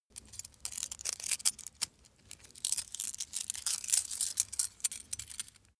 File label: small metal handling; industrie wirtschaft; metall